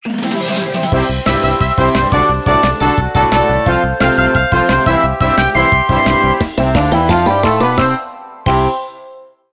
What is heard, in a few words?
BGM Clear; Kuma-mon Bomber Puzzle de Kuma-mon Taisou; 3DS